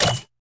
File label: ui challenge window open; daily challenge